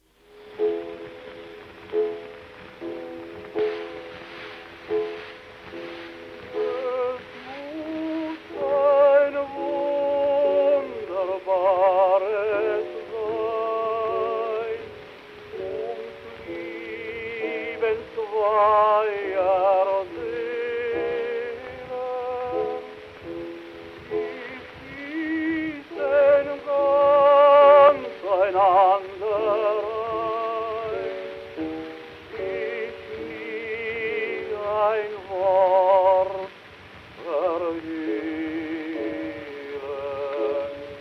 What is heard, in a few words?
Gramophone